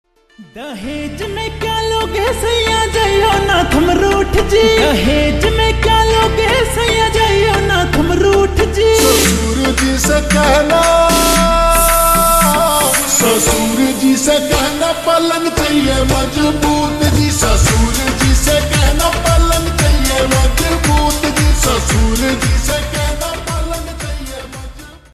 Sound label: Slowed Reverb Ringtone